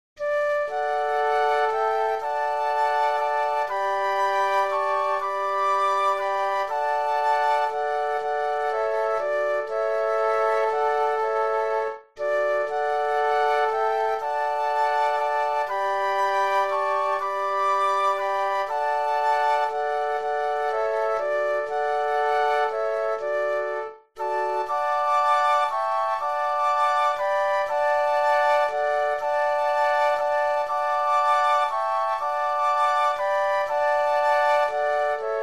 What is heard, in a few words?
Flute Quartet